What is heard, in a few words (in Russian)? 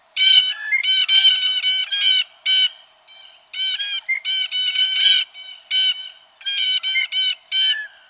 golubaya sojka cyanocitta cristata